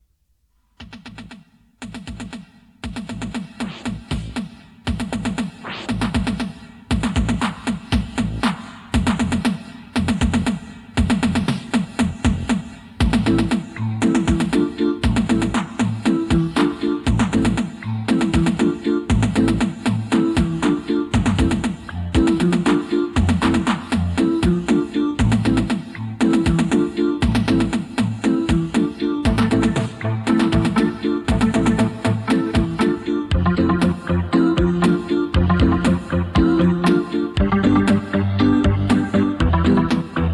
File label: Disco